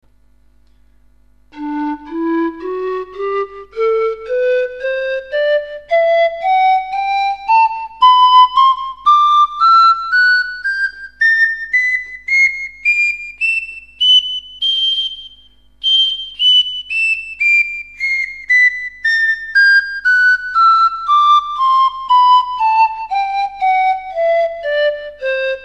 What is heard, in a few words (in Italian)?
tenore